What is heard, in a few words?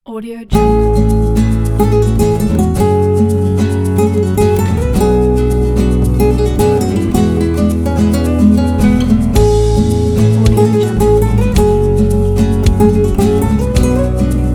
spanish guitar